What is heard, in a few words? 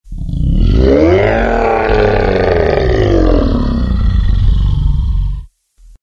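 monster sound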